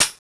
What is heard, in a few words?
oicw foley